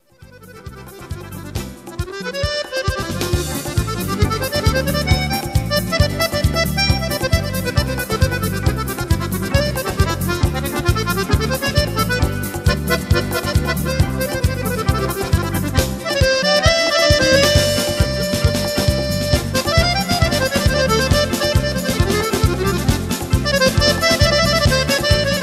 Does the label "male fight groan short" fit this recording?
No